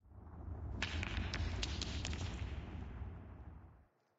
crumble, Mines; AMB; OneShot Fading Memory

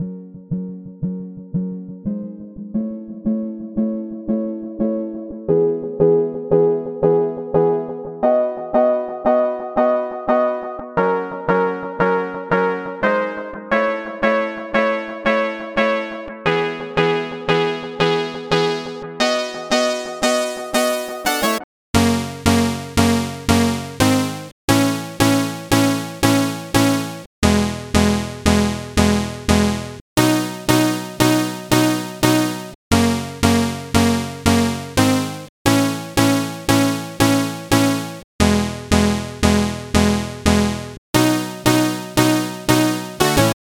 175BPM Electro

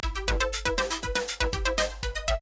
success

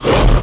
hit fire

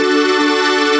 b major left

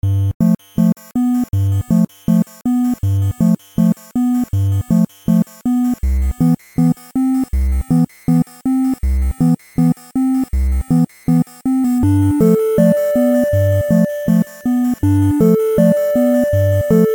blip mono; OneShot Fading Memory

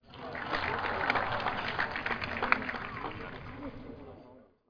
crowd bogey

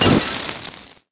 lantern break

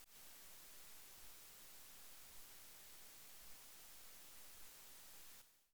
elevator break; OneShot Fading Memory

